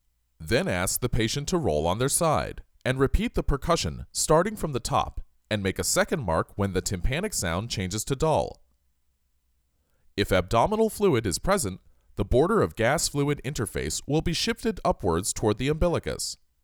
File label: Percussion; Ab Percussion